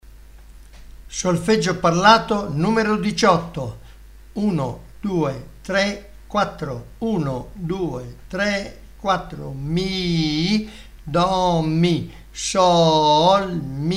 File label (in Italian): Solfeggio Il mio amico flauto